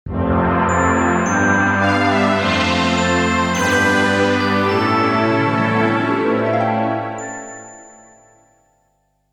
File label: jingle; Rayman; Ubi Soft